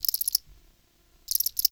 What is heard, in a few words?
Prairie Day Close Cricket; Ambience